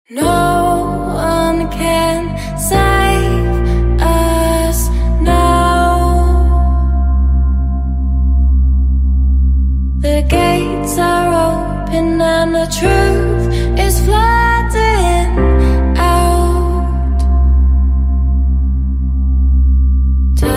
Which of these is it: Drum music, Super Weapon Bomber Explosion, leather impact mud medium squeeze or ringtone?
Drum music